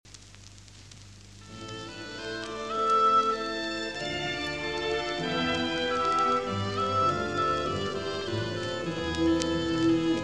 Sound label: branle de bourgogne, moderato; Röntgen; Six Old Dutch Dances